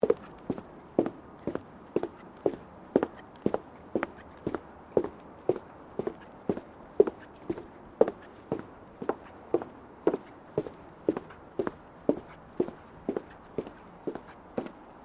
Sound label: FOOTSTEPS WOMENS LOW BOOTS BOARDWALK SEQUENCE; Footstep Foley; Human